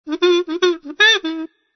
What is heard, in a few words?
SAXOPHONE